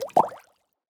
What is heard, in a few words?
bubble burst; CrossCode